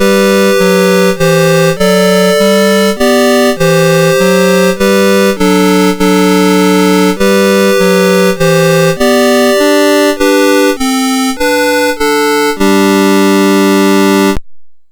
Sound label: Jesu Joy of Mans Desiring, MOS6581R2